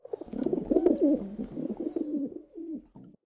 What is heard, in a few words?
wild Pigeons Idle; fairyhansel